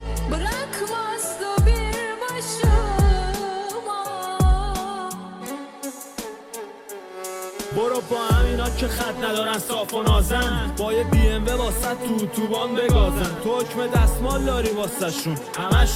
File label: Remix